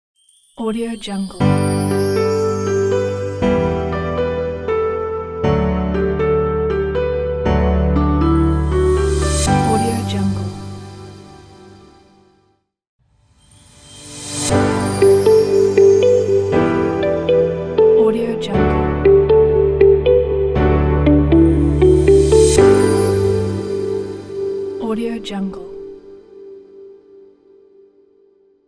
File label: corporate logo